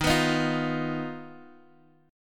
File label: D sharp Suspended 2nd Sharp 5th, Guitar, Half Step Down; chord